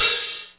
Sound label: HAT, Hip Hop